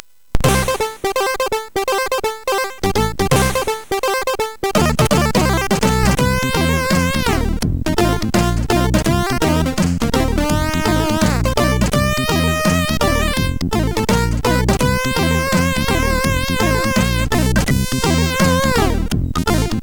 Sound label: Rock, sid; MOS6581R4; hvsc